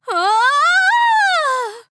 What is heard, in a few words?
Falling; Princess